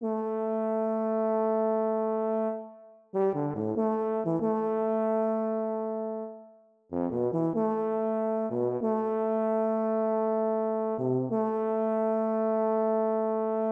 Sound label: Soliloquy for Tuba; Film Classical